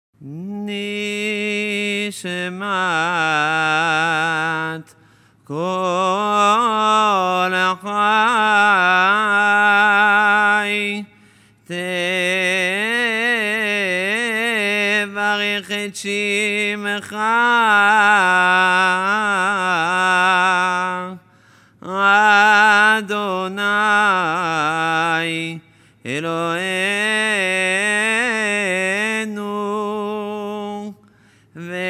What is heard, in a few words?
Nishmat Kol Chai; Rosh Hashanah; Shahrit